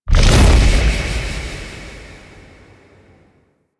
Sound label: Wraith Reaper Deathly Wall Cast; Warframe